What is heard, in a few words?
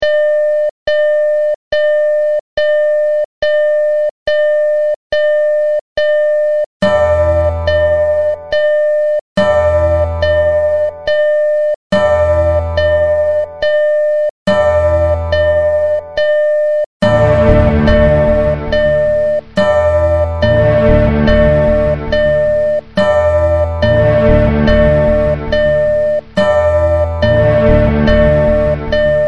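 startup, Game Music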